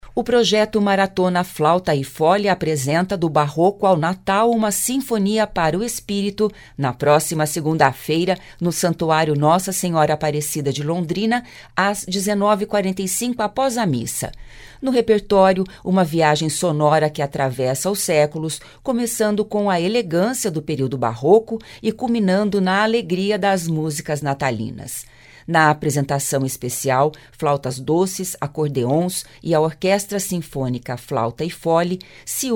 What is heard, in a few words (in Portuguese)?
FLAUTA e FOLE; Revista do Meio Dia